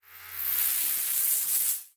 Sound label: Title Transition Electrical Zap; Adobe; Production Element